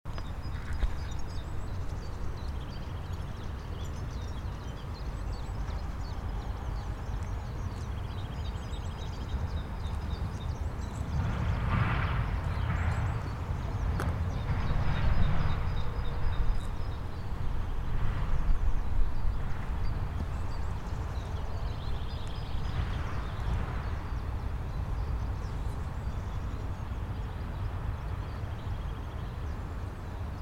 Fruehling in der Stadt

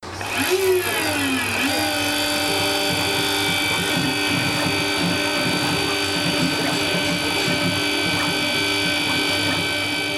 graviermaschine

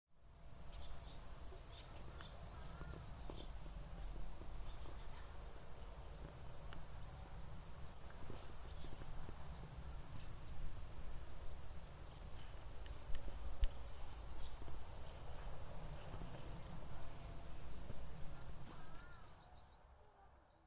vogelgezwitscher

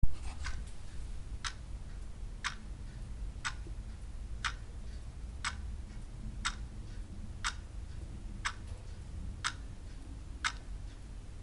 wanduhr

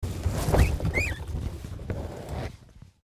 Meerschweinchen

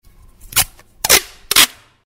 klebestreifen

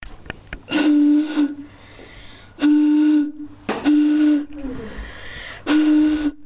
in flasche blasen

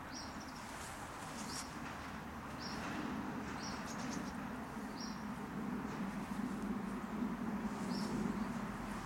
voegelgezwitscher

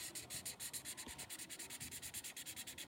Filzstift

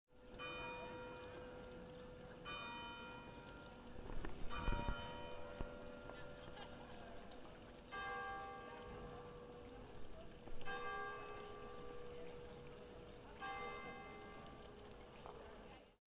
kirchglocken

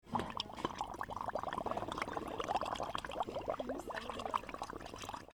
blubbern

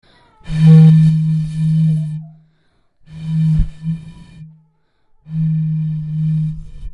dampfer

